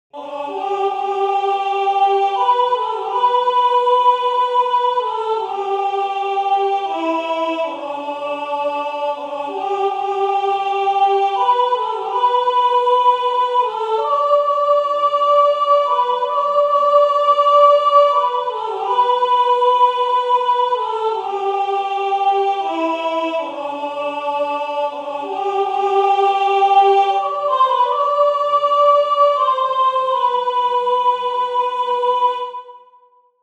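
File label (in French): tenor, choeur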